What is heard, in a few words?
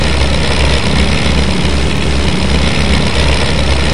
leclerget, sopwith Camel; Bombable; flightgear; yasim